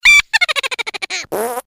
Hahahahahaha, risada

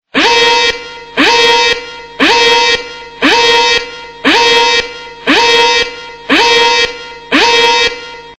sinal de alerta e panico

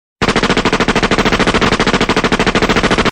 sons de tiros rapidos

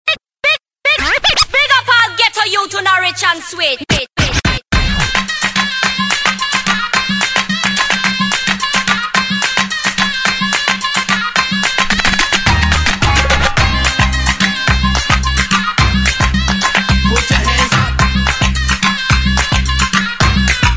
toque arabe para celular

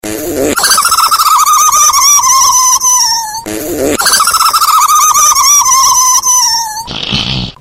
peido comico falar, sons de peidos e risos

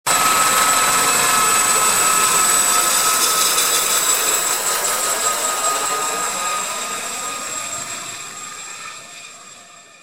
helicoptero sobrevoando efeito sonoro

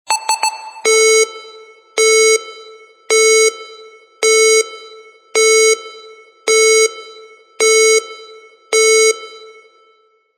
frequencia cardiaca

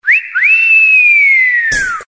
assovio

